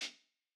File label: Jazz hop hihat